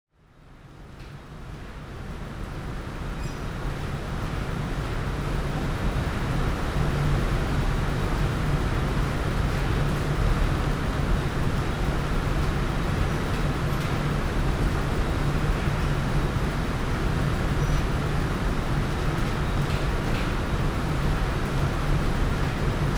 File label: Ambience; PrinceOfGlory; OracleStudio